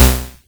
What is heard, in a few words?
jump; opentdm; bunbun